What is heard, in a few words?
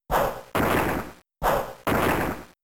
Rock Blast 2hits; Pokemon; Gen 3; attack moves; RSE